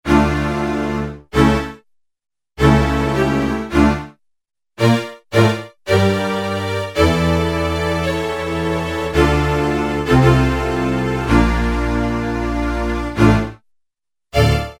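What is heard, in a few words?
Strings Contrabass f; Roland L-CDX-04 Strings And Orchestral Percussion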